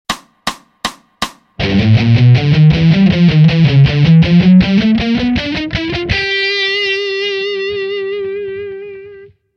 Mixolydian Mayhem Lick

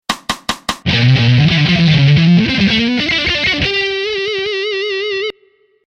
Mixolydian Mayhem Lick; Fast

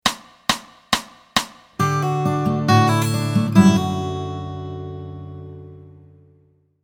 Open Chords With Fingers D

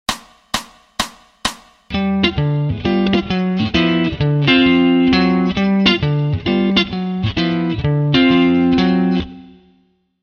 Walk Bass Lines

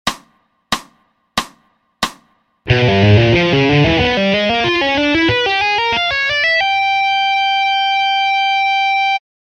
Diminished Scale Taps slow

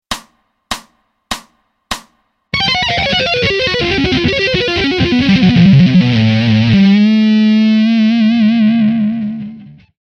Atonal Tapping Lick Fast

